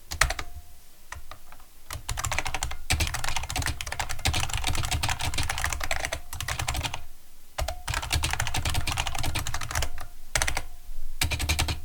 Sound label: ibm model m